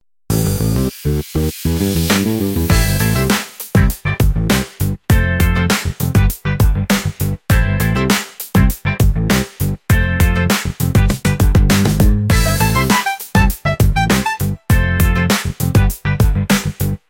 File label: breakbeat boogie